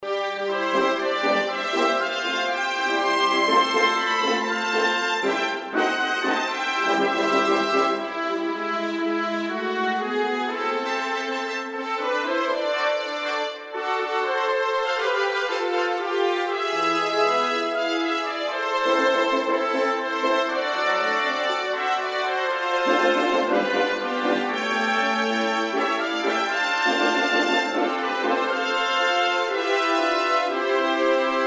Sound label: Melody